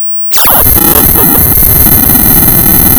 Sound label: Sound of tearing paper, center